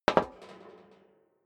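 step dress boots metal rattle single stair down, footsteps; schritte; menschen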